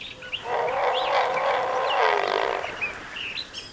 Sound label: great blue heron